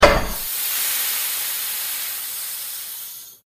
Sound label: Shoot Button; Vind